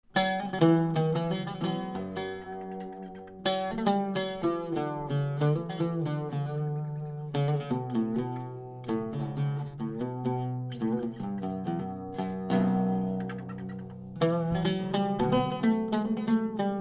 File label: oud